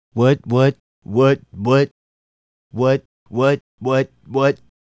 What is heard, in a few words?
Male Solo, Hip-Hop and RnB Vocals